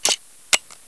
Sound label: musket clip in